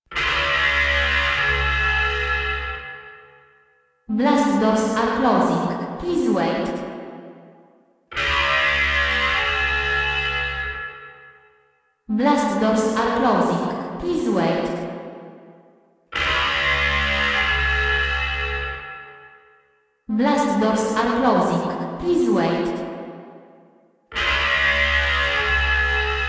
Blast Door Alarm Loop; Warframe; Ambience; Nineteen Ninety Nine

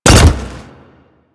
Pet Land; Warframe; Alad V Pet

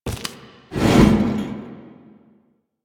Jump Slam Foley; Warframe; Veilbreaker; Sprag

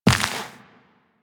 Step Dirt, Footsteps Walk; Warframe; Tenno

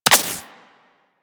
Laser Impact Flesh; Warframe; Weapons

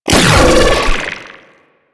Riot Moa Net Ability Fire; Warframe; Attacks

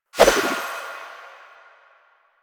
Kunai Whoosh; Warframe; Weapons; Choir

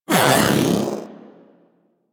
Vocal Attack Melee; Warframe; Pets; Zanuka; Zanuka Companion A

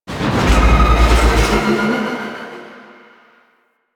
Elevator Start; Ambience; Warframe; Infested; Golem Trial

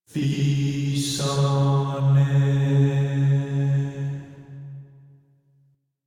Rand Chant D; Warframe; DE; Hubs; Syndicate Arbiters; Tenno

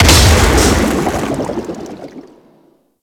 Underwater Explosion Small; Warframe; Grineer Ocean

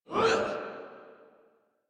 Pain; Warframe; Leekter; en

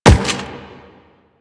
Run Rock, Footsteps; Warframe; Quests; The New War; Kahl

